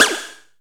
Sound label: Shock Snares, Percussion; Dance Synths